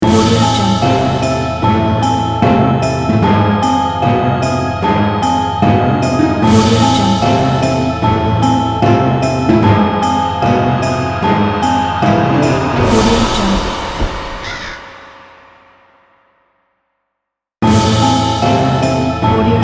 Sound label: halloween intro logo; chekarino